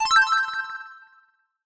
multimedia alert delayed high pitched correct positive